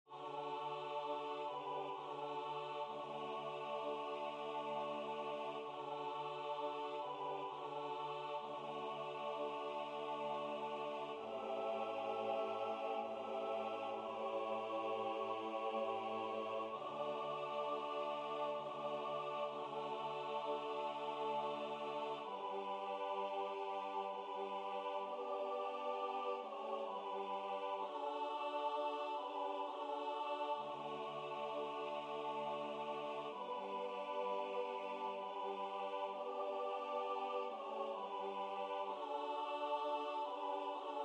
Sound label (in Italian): Stille Nacht heilige Nacht tutti; Astro del Ciel; COMUNIONE